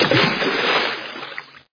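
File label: water single splash close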